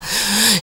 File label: MALE SOLO, Heart of Africa